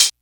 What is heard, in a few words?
hi hat closed sound g key, Royality free closed hi hat sound tuned to the G note